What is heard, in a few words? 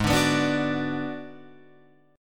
Ab Minor 7th Flat 5th Ab, down, Guitar, Open F; strummed; JGuitar